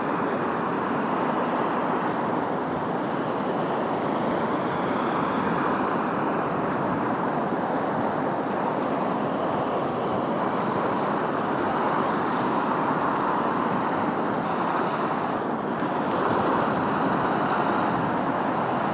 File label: Seagulls; hiberworld; android; windows